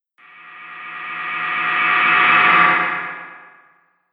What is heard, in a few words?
Whoosh Fx Ominous Darkness, Scary Soundscape; Andrew Fleming